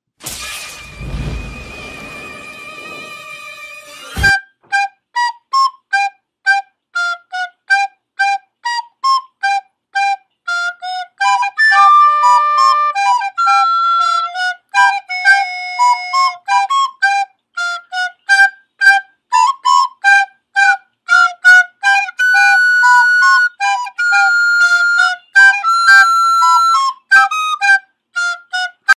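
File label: fleita, flute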